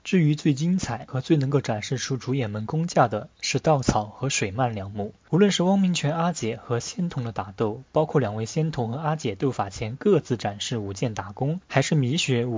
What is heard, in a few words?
audience scene; stage musical 80s 1982